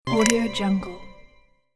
magical mystery button

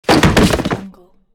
box drop

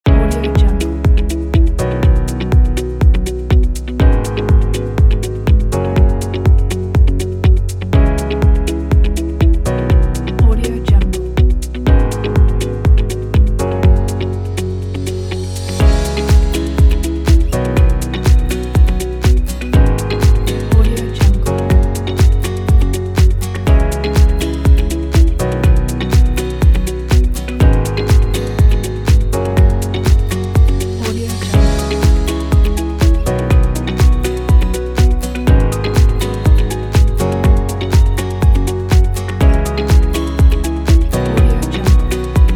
tech corporate pack

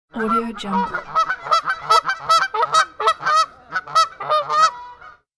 bernikle goose bird

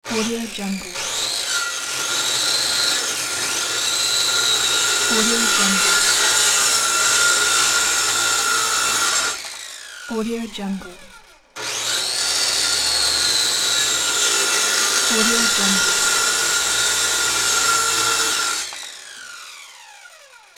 saw electric hand

